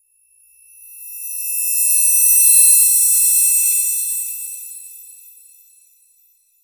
a shimmering holy chime

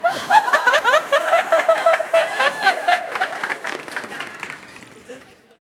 excited cheers laughter, workplace

